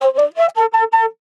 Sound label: Am Flute Lick